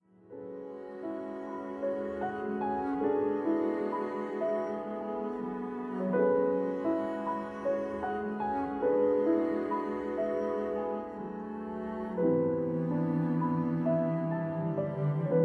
piano sketches

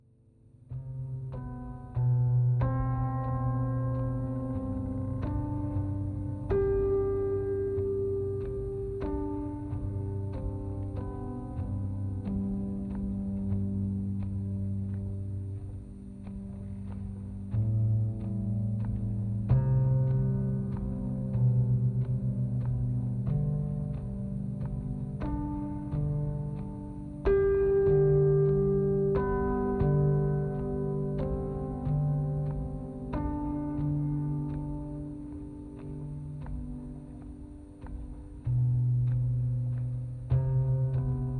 five pianos

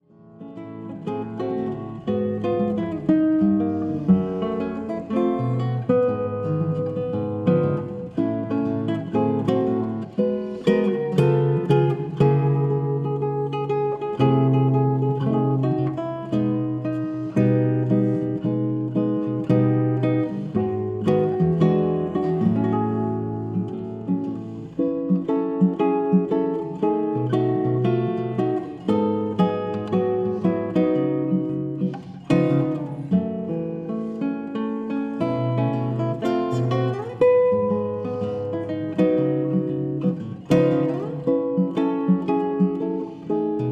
violao violao

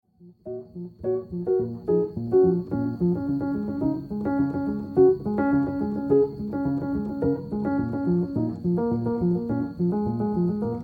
piano

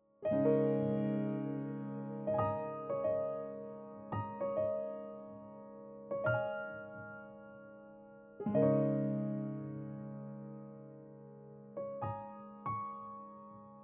silent rain

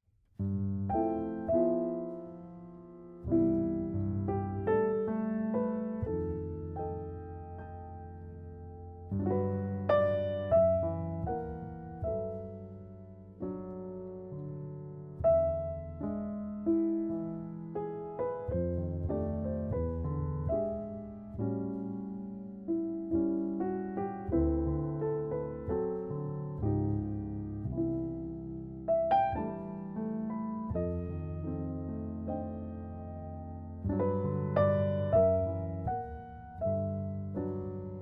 jazz lullaby

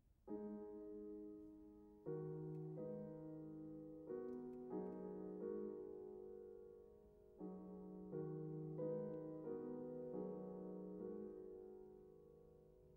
piano wind; poulenc

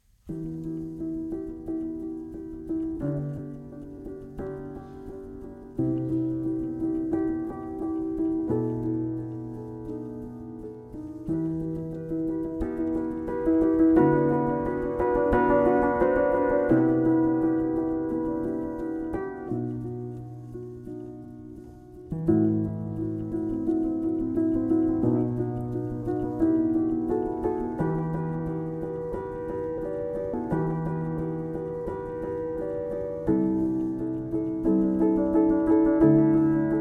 piano diary

